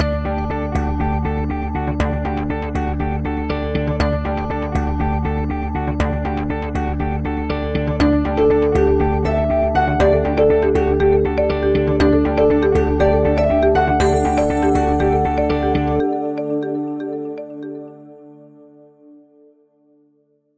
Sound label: jingle TV